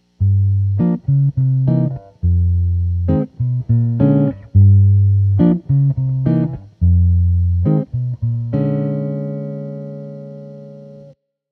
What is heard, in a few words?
dark